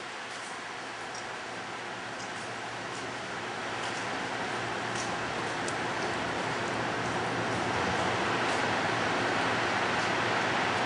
Yellowthroat F

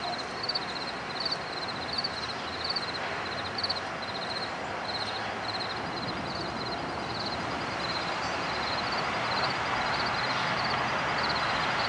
Wood Thrush

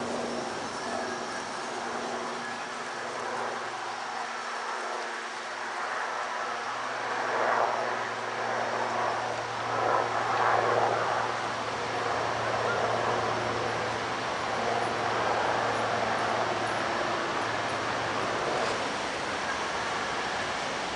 Hermit Thrush

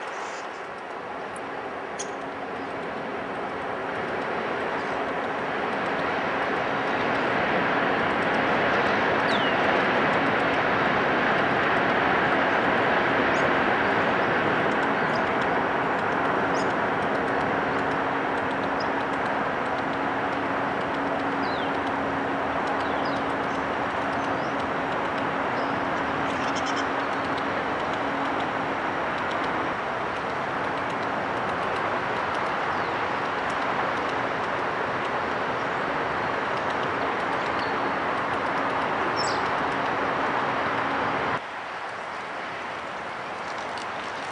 Downy Woodpecker

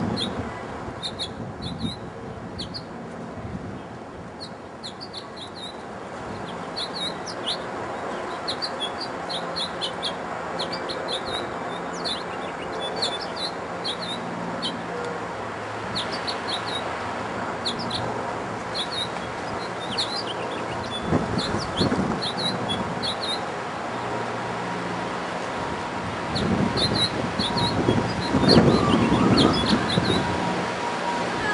housefinch

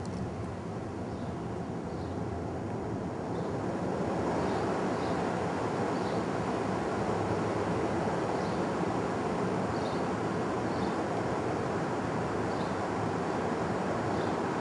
black&white warbler

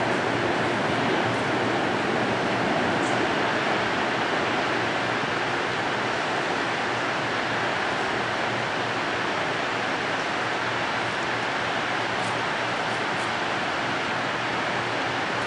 Ovenbird

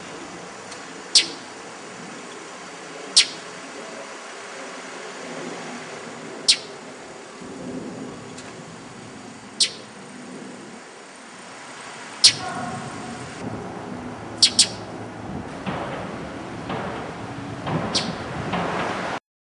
mockingbird

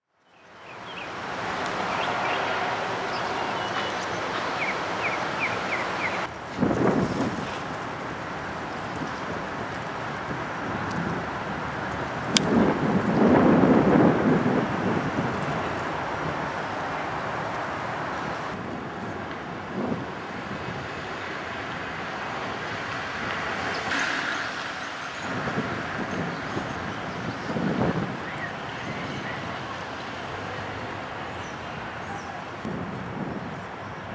eastern Phoebe

